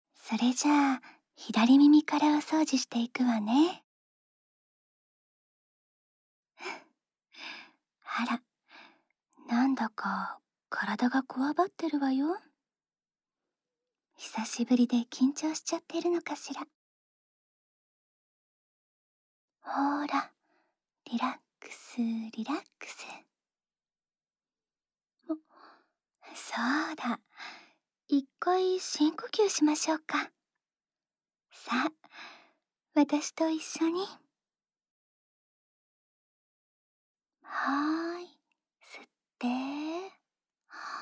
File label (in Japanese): 竹耳かきで左耳の耳掃除, ASMR; 【耳かき&添い寝】ママといっしょにおねんねしましょ 〜おっとりママ編〜